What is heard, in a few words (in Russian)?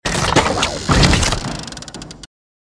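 в строительный мусор с деревянными предметами; Звуки падения человека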